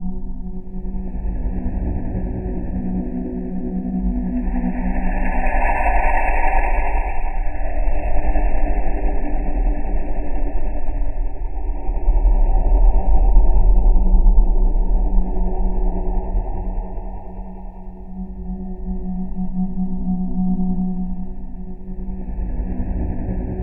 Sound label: SUSP AMBNCE